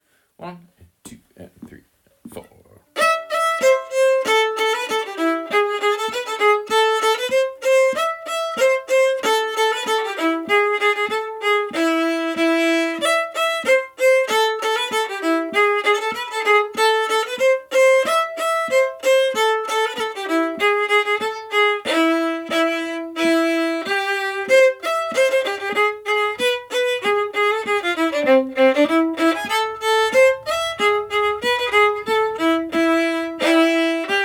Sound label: sisu polka harmony, fiddle